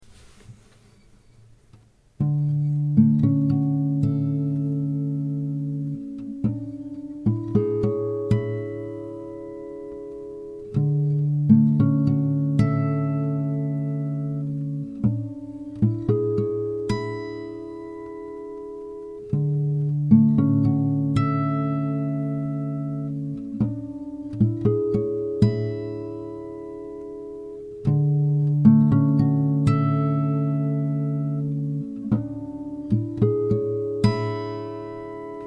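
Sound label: A 112 guitar harmonics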